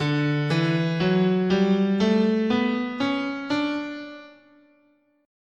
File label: D Ionian, scale